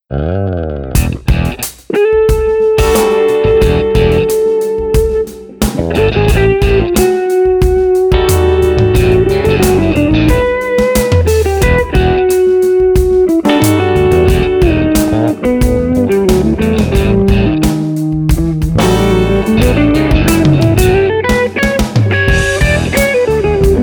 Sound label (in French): petite musique